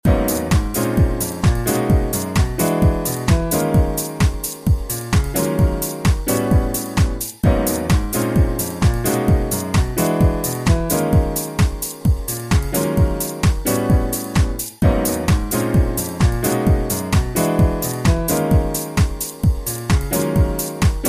classic dance music

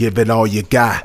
Rap Vox

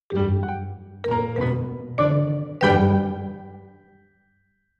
Plink Plonk

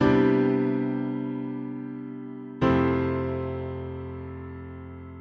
c maj 7 trip hop piano loop 92 bpm

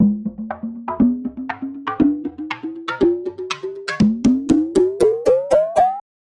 Beach Day Perc Build 2 120 C

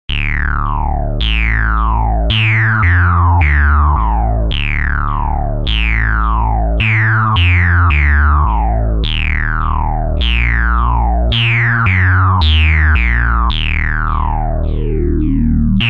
spaceship horror synth

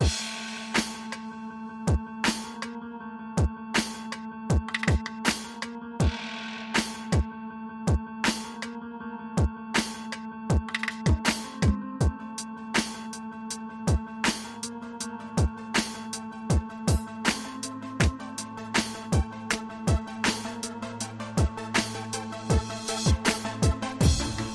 Rhythm and Melody Elements 80 bpm remix

